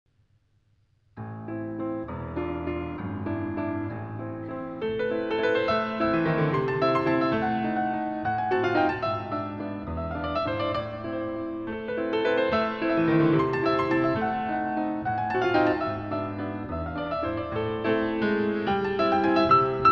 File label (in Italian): Valzer